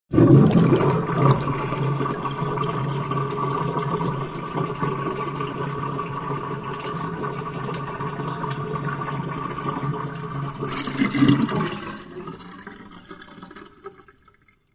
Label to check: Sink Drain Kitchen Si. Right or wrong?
Right